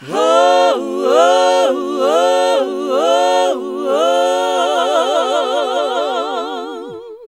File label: HAL GOSP. MIXED; Sound & Vision - Gigapack II CD 1 (Roland); HAL Gosp.Mixed 2